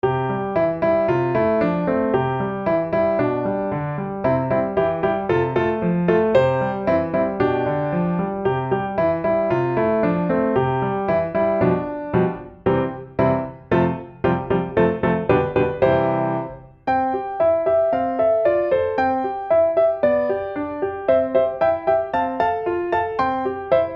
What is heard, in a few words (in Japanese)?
donguri korokoro piano